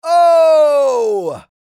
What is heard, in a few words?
Shocked male reaction